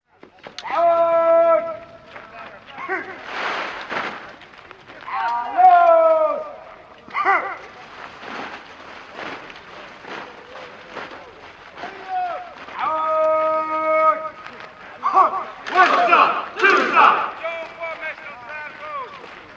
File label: trainer soldier walla; modern warfare 2; amb emitters